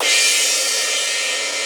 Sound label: D70 CRASH; Crypton - DrumBase5000